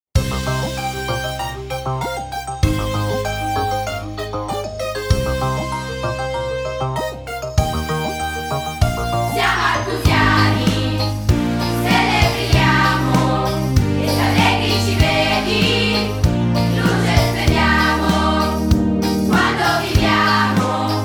Base Strumentale

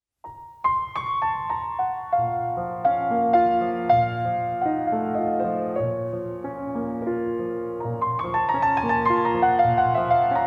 Notturno op.9 n.1 in si b minore; F. Chopin

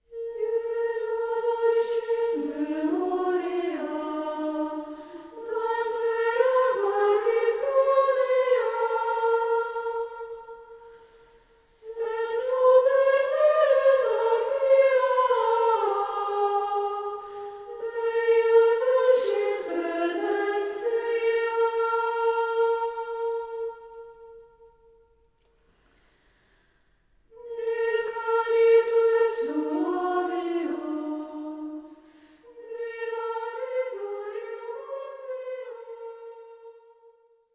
Inno Gregoriano; Jesu Dulcis Memoria

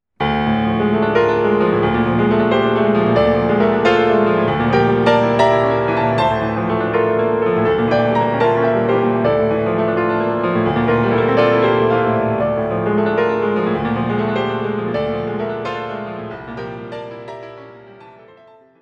Preludi Per Pianoforte; Dieci Preludi

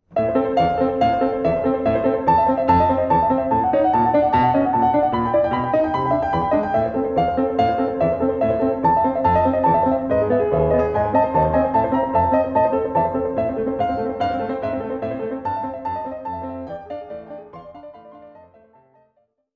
Sonata N.1 Per Pianoforte IV Tempo

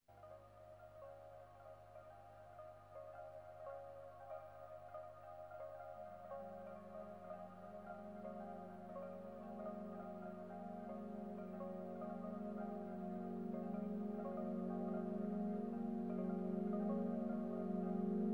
Rilassamento Armonico Musicale

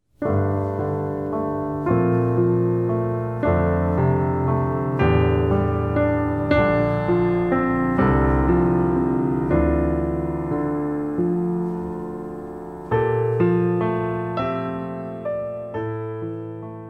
Preludi Per Pianoforte; Dieci Preludi

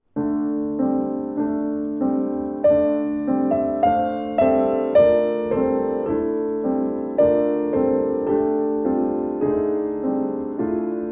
Sonata N.1 Per Pianoforte II Tempo

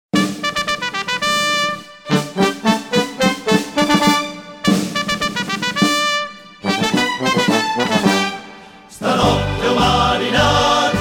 La Ritirata Coro

